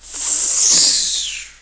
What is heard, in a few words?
zipline